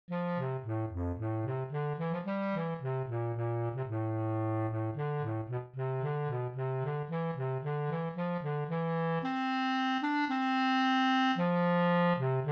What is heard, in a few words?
bass clar